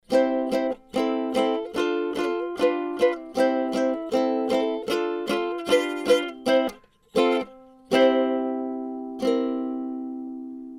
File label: Universal Audio Volt 2 Ukulele